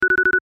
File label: alarm